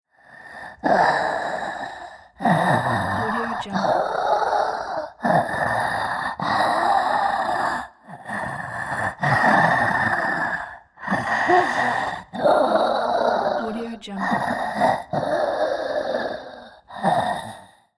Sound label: witch wheeze and breath